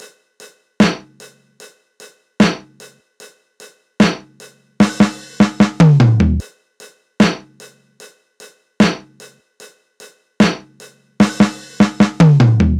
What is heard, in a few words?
Top Drum Loop 150 BPM; Cymatics